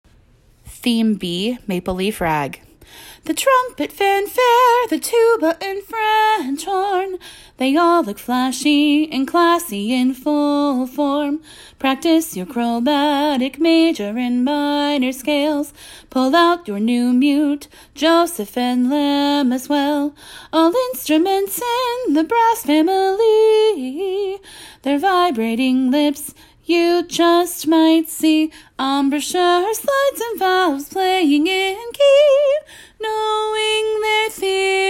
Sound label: Maple Leaf Rag; Theme B